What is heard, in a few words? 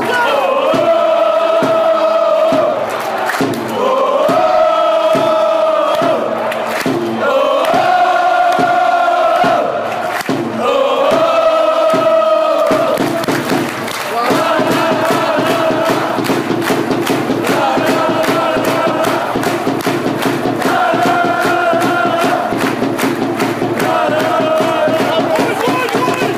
song; dale cavese